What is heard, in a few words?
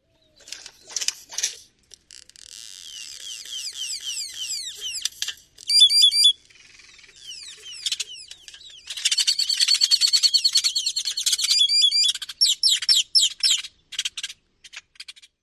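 song, bird; TX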